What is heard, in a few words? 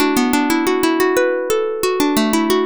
plucked